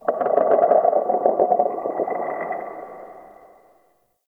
Under City Texture Low Croak

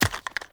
Acorn Drop; Objects

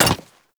Hyena Micro Step Default, Footsteps; Warframe

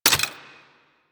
Bubblegun Pistol Mech; Warframe; Weapons; Tenno

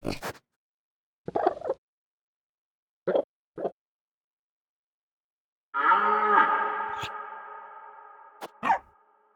Fawn Vocal Close Momma Call; Echo Young Deora

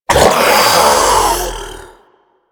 Shoulder Fire Start; Enemies; Infested; Warframe; Femur

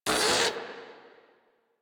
Catbrow Combat Vocal Pain; Warframe

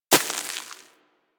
Bullet Impact Debris Snow

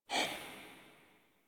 Tarot UI Emotion Hover; Warframe; Harrow; Tarot Emotion Choice

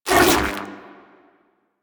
Shield Drop; Warframe; Volt

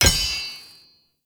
Blacksmith Hammer Strike; Characters; Blacksmithing; Friendly; Tuvalkane

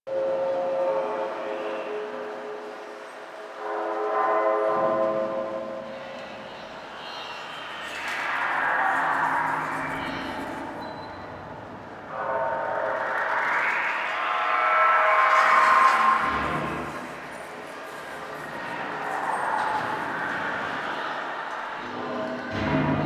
Interior Hallways Lab Bed Loop; Warframe; Ambience; Entrati

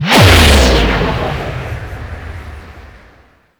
Stomp Explosion Shockwave; Enemies; Abilities; Teralyst; Warframe; Bigalyst

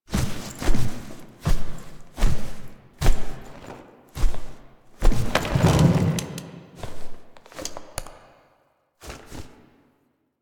Sit Down And Eat SFX; Duviri

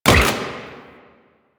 Rifle Fire; Warframe; Venus; Venus Elite Spaceman Leader; Supra

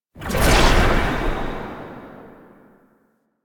Passive Energy Pickup; Warframe; Dagath

